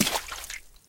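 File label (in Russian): всплеск поплавка на рыбалке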